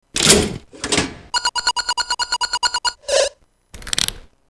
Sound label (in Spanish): palanca